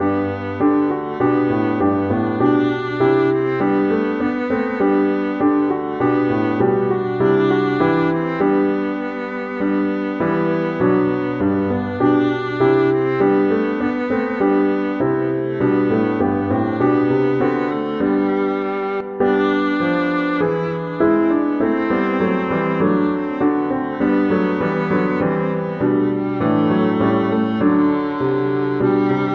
viola

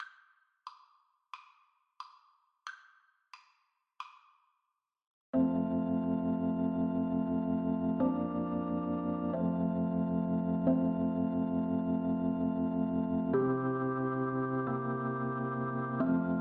swing low clarinet

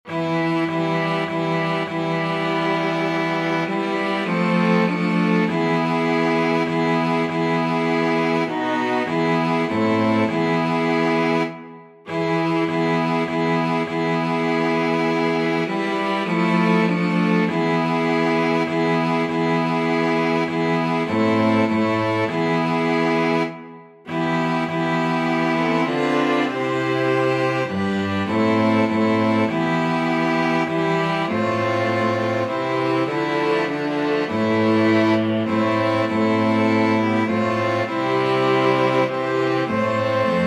STRINGS, string quartet

